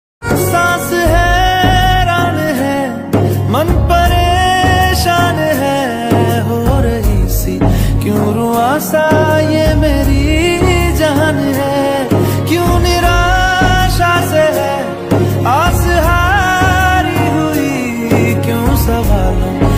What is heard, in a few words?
romantic love song ringtone